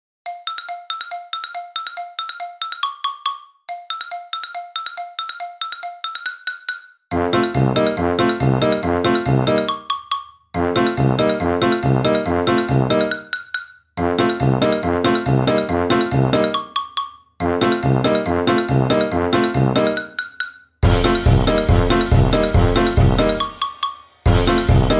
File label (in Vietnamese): Nhac nen hoat hinh vui nhon va hai huoc; www tiengdong com